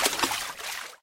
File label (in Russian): vsplesk vodi v luzhe